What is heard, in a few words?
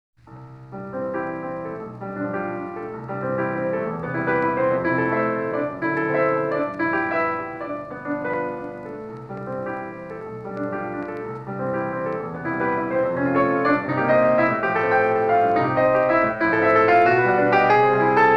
Prelude in C major; Frédéric Chopin